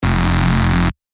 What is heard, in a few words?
bass shot F; revealed bass shots vol 4; Supa